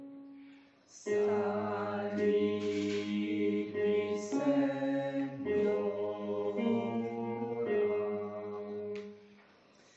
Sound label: alto